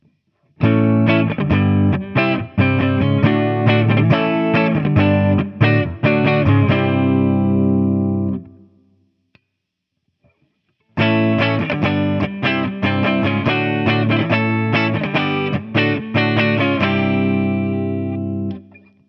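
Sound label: Guild S200 T Bird USA Clean, guitar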